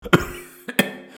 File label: short cough noise